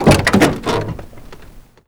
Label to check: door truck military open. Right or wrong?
Right